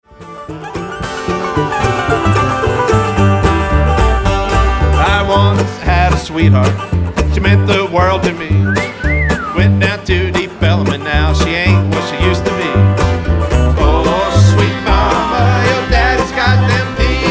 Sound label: Deep Elum Blues; Live From WGB